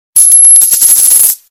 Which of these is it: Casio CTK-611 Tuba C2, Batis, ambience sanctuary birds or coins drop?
coins drop